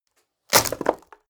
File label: wood break short; foerster